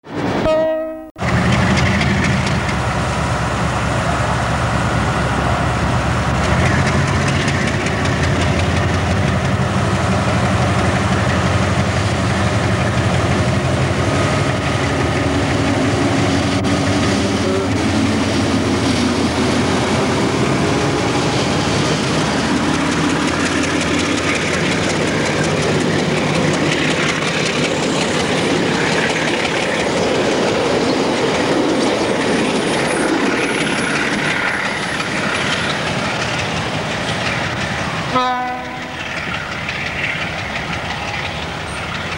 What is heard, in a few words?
New Zealand Rail U26C 5074 leaves Otaki with train 600; NZ U26 5074; Diesel